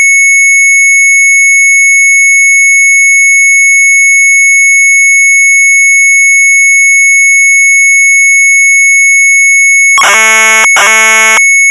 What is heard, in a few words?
MZ800 Tape; AUTO RUN; sharp; retromelsarchive